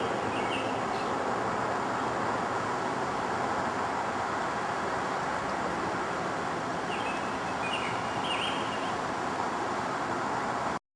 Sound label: common yellowthroat